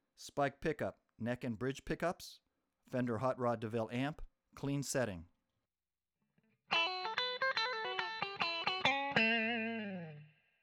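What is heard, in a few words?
spike neck bridge hot clean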